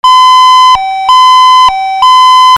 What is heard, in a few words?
VEHICLES